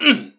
Swing; doctor

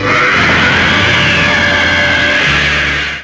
scream

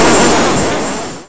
teleport warpball

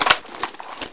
mine pickup, weapons

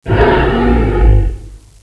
kp pain

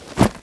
kick

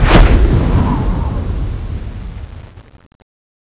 ironfan slash, weapons

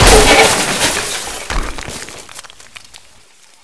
apartment hole crash

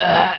zombie pain, male

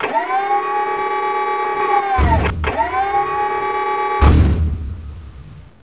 fan

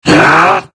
pain, paralyzed subject

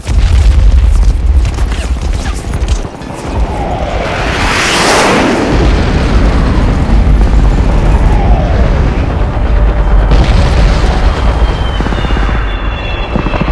battle near

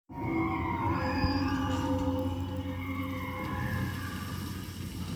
Monster

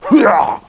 attack; caleb

